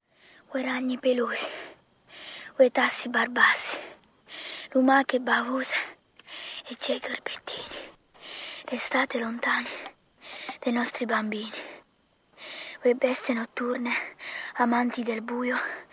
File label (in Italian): Rumba; Mono